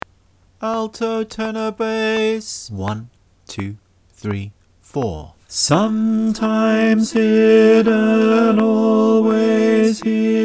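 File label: bass, choir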